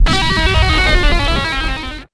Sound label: jump far, skater; Tony Hawk's Project 8; XBOX